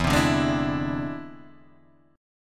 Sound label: E Augmented 7th E down Guitar Drop D